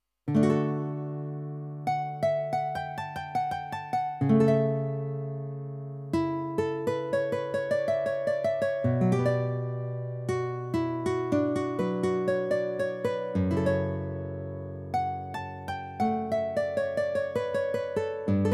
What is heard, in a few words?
Guitar